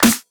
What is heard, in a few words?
dry steel snare drum sound a sharp key